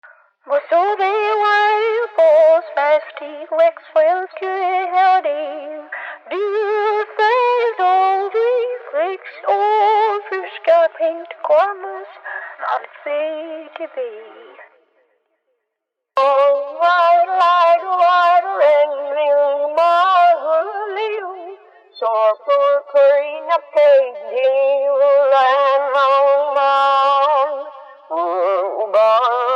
evil granny singing a haunted lullaby the creepiest horror sound ever